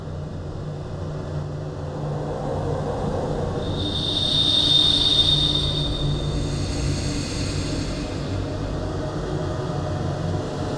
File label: ambience cave; The Swapper